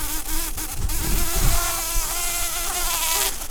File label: bumblebees, Pascuorum queens